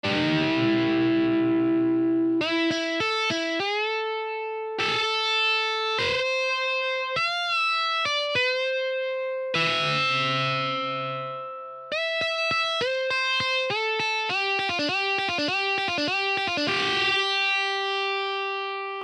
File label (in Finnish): Harjoitussoolo